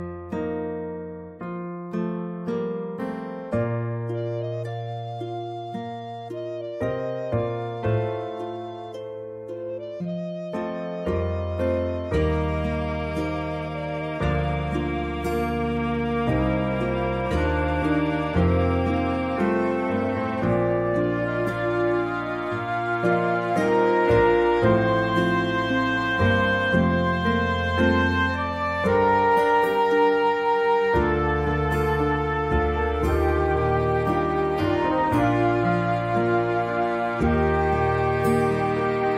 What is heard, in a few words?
Instrumental